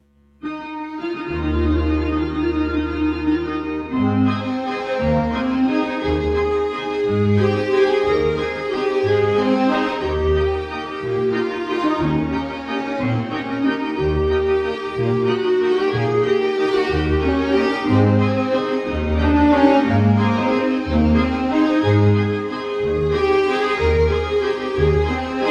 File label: over the waves solo performance; Cez murski val or over the waves